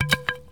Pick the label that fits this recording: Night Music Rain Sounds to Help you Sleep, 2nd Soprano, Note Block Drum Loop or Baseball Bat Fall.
Baseball Bat Fall